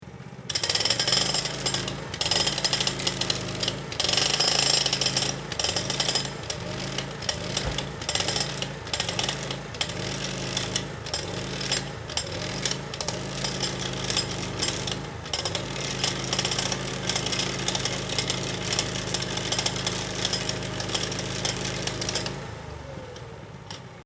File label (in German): Presslufthammer kleiner